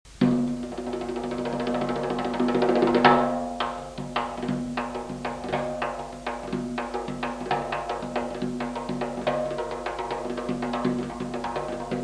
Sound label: dhol